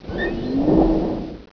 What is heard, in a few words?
WHALE